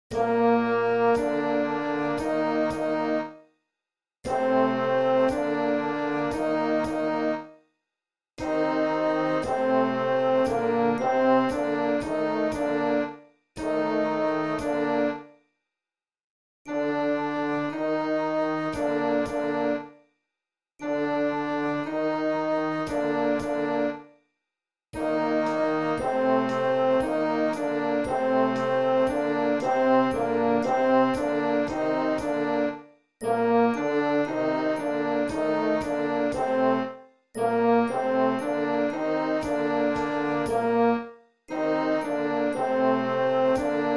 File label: concert Duet